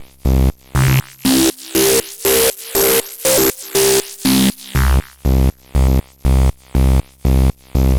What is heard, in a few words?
Synth